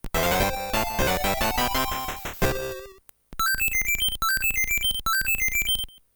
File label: jingle ~ world clear + extra lives